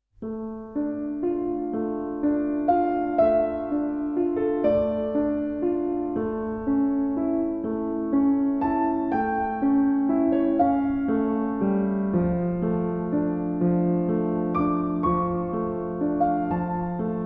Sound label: sad piano theme; drama